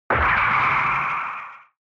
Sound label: Retro Anime Accent High Mid